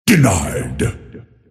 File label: deny pain; Earthshaker